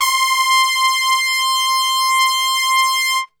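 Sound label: C 4 trumpet straight, Brass; Best Service; Brass Super Section; TRP STRGHT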